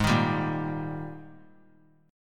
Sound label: G sharp Major 7th Suspended 2nd; 20,22,22,20; JGuitar